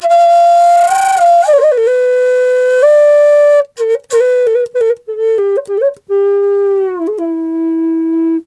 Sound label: E wild flute; Big Fish Audio - Didgeridoo and Other Primitive Instruments